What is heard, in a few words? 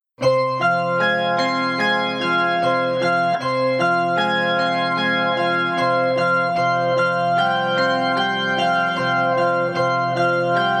creep funk